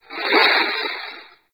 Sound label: Video Stop; Warframe; NineteenNinetyNine; Stage6TheCatCameBack